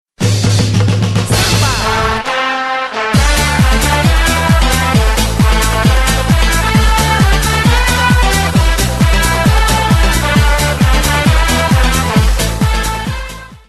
samba

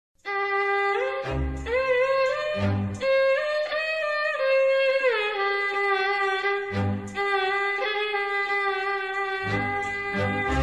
tamil instrumental

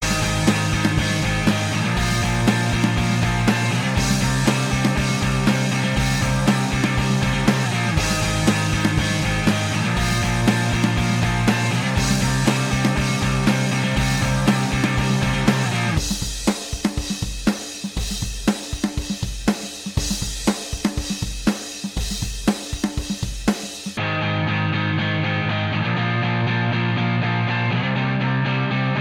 rock instrumental

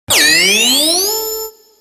buzzer; catchphrase

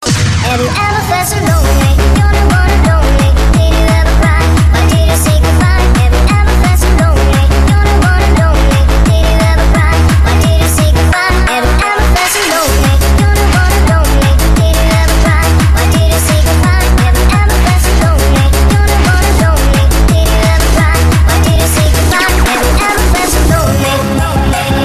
thai disco

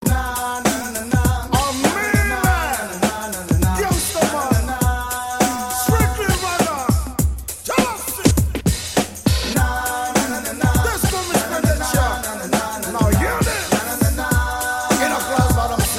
african chillout

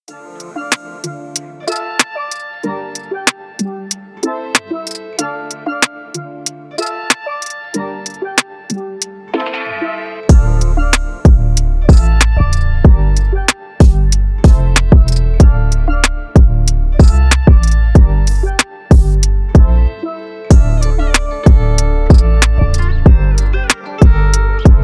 instrumental

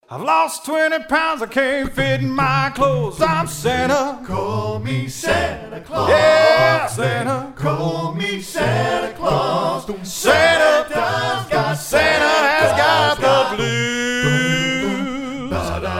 santa blues

